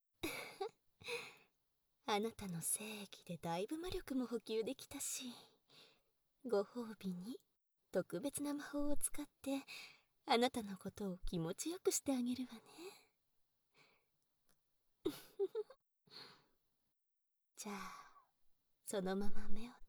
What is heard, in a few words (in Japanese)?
耳舐め（バイノーラルマイク&立体音響編集）, ASMR